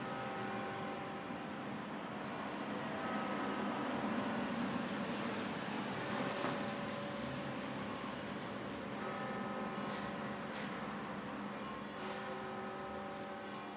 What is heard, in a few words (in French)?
cloches eglises a midi fribourg suisse entre la cathedrale et le musee; gutenberg